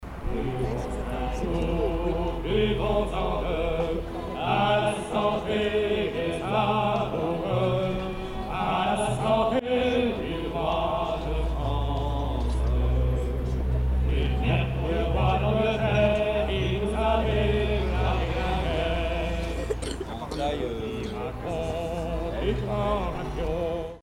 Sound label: background music; Cherbourg; Holiday 2001 in France